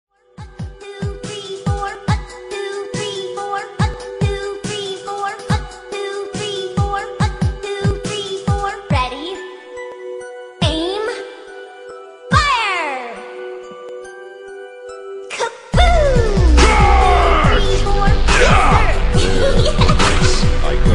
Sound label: dubstep ringtone